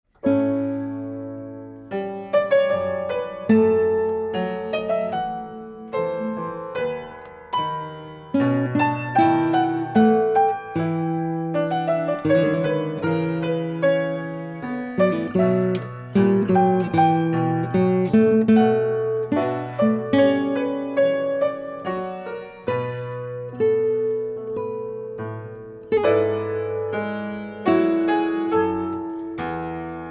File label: Classical Duo